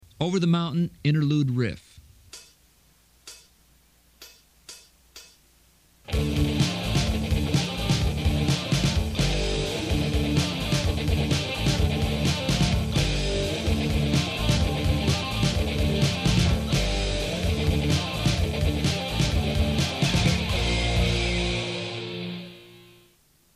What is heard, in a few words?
interlude riff, guitar